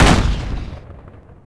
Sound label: shield; NanoFXv2; ExplosionFX; ExpShield